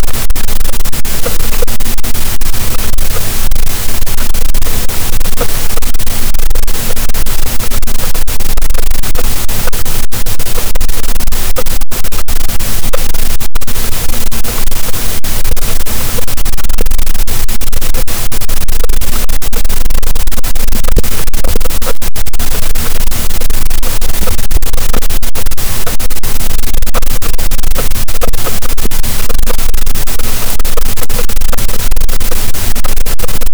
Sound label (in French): cachalot, Physeter Macrocephalus, gaussian noise